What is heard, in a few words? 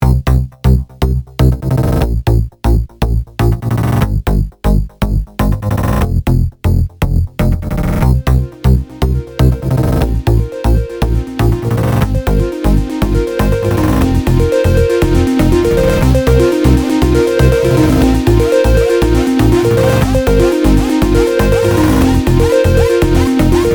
electronic cue